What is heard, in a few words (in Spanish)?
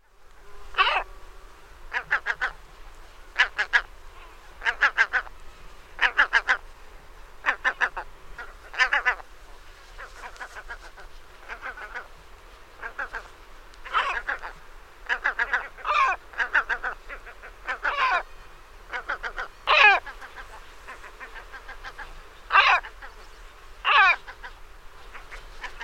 Gaviota